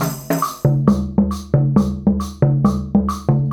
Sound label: Djembe, Tambourine, and Wood Block